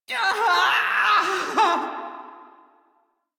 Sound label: Death Male; Railjack Crew; Perrin Sequence